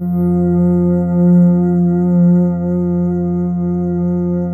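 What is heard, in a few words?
touch strings F2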